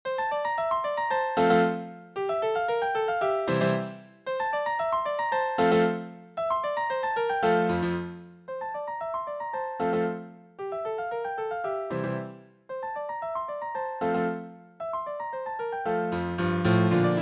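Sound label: Etude-Caprice, piano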